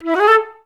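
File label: flutes A3; Best Service; Peter Siedlaczek's Advanced Orchestra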